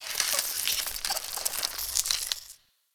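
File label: Ice Crunch Random Ambience; Warframe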